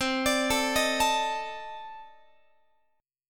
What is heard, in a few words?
C Minor 6th Add 9th C Guitar; Cm6add9 arpeggiated; x,20,17,19,19,20